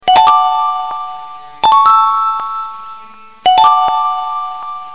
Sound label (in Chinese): 初中上课, 铃声; 广播室铃声系统